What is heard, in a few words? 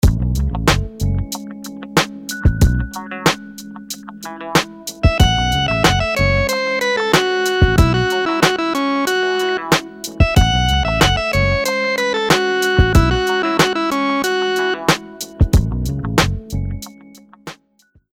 rhythms dotted 8s